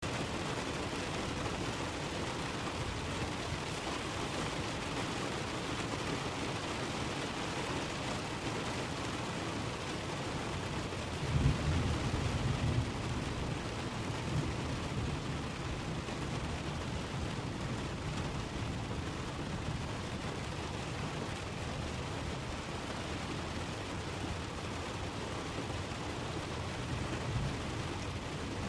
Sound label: Rain Village; 1944 Battle Of The Bulge